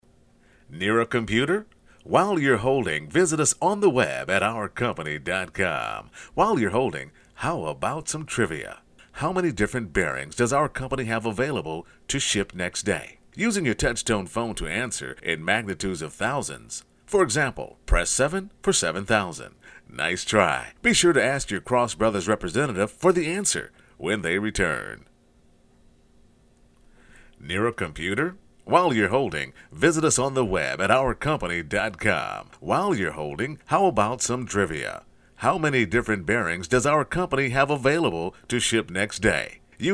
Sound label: onhold